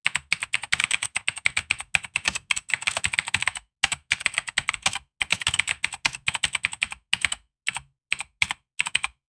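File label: create a mechanical keyboard, typing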